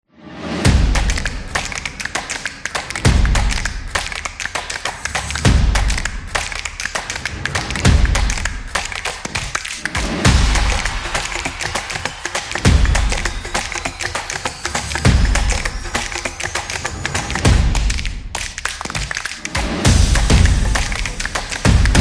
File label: Upbeat Real